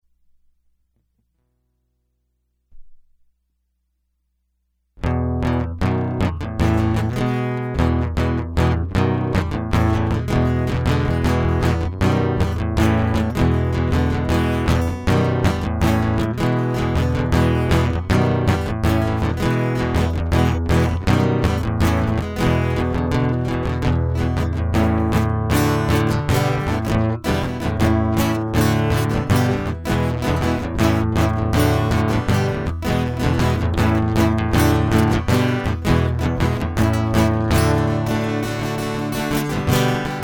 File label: Guitar Loop